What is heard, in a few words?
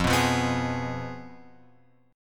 F Diminished, guitar chord; Fdim Chord {1 2 3 4 X 4} strummed